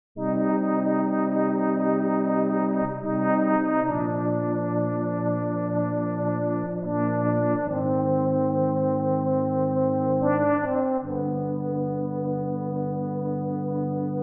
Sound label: brass group